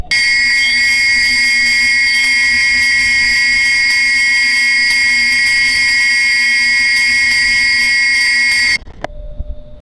basketball

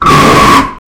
creature

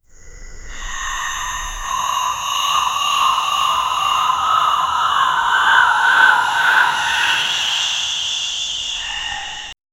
spooky whispers ghosts

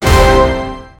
military

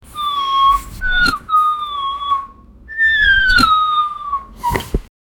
the most cwos sounds, crow

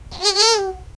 bunny nose twitching

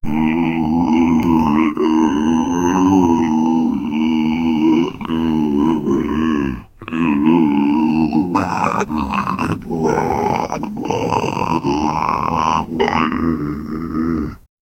growing ringing in the ea

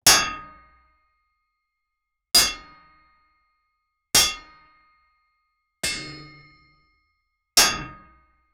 metal clang

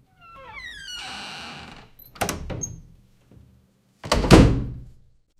door opening sound effect; doors

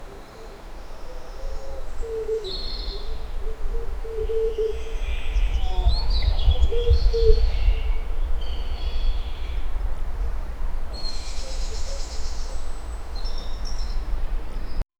a parrot screams

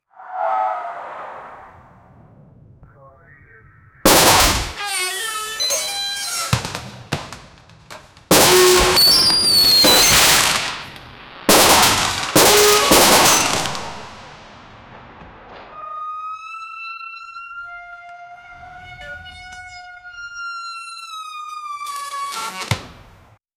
a special forces unit beg, assault

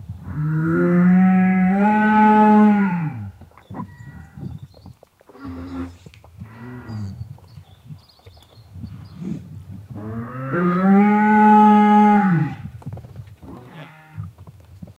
cow and lion fighting

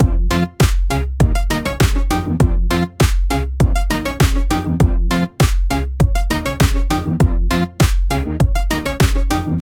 upbeat dance music

